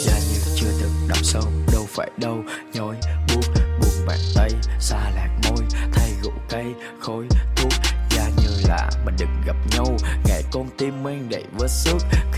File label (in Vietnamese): Nhac Chuong Rap; nhac tre